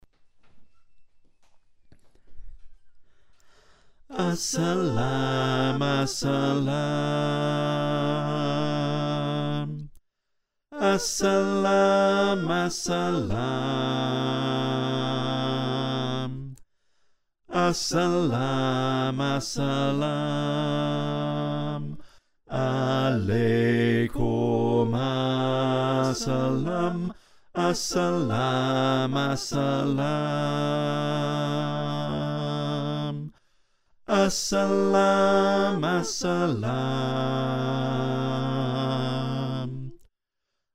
Bass

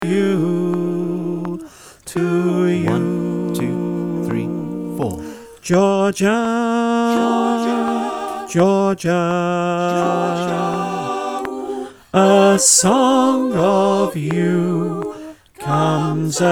bass click

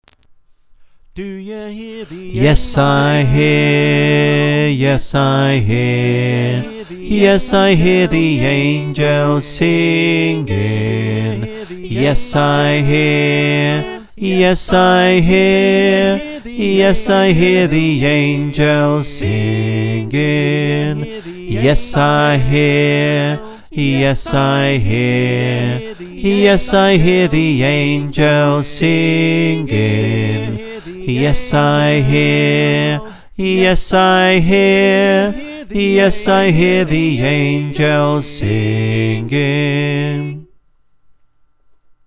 Bass

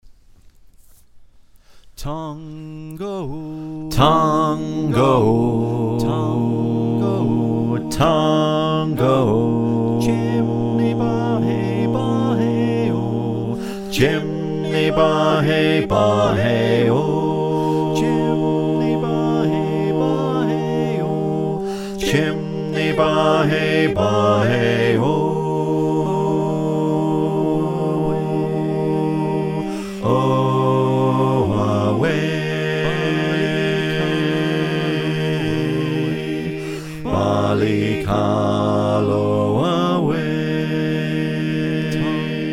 Bass, choir

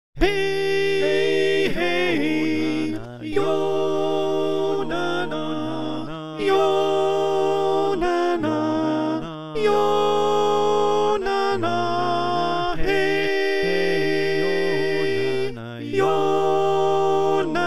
Alto, choir

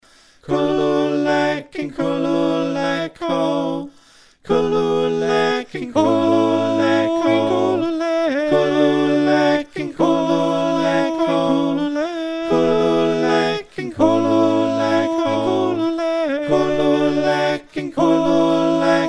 Call SATB

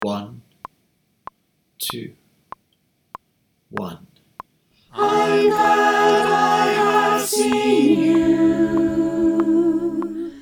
Soprano w click

